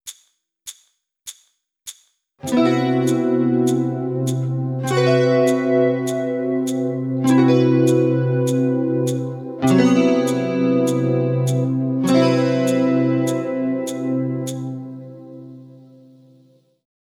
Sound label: Fretboard Navigations, Guitar; Guitar Techniques 2018 Issue 289 December